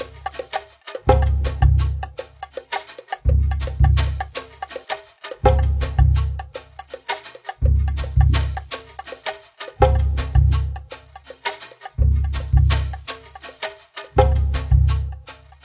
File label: Jungle Level